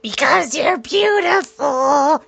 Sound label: stupid voice because, male singing